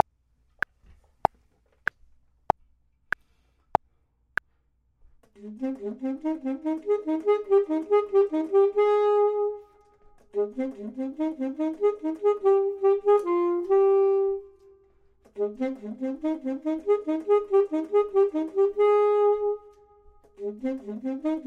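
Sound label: bottom, Saxophone and Clarinet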